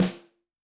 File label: Snare, Yamaha DTXpress-II